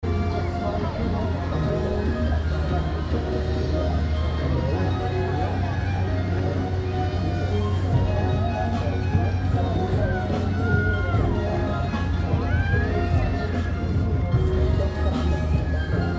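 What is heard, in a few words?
ambient fairground noise, Cambodia phnom penh; 2019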